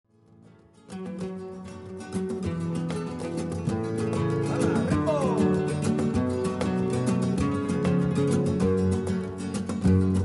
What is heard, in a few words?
gipsy rumba